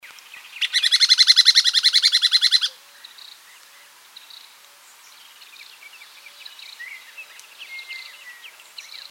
Carpintero Bataraz Chico, Checkered Woodpecker, Picoides mixtus; cantos